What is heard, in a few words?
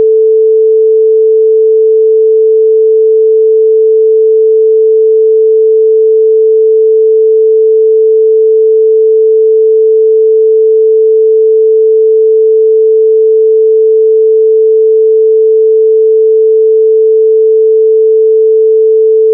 sine 440; kdenlive